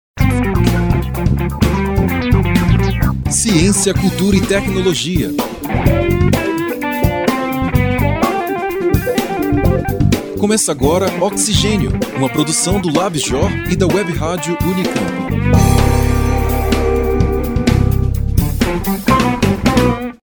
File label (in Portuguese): vinheta abertura